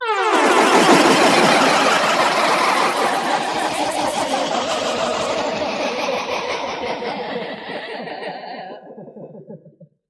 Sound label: Eerie Laughter; SFX; 7rystan's 700+ FREE COLOSSAL EDM SAMPLE PACK